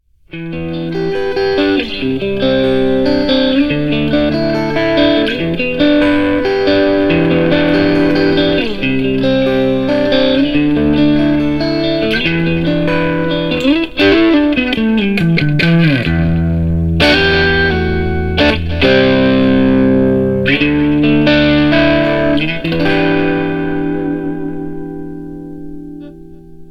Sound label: E Guitar Picking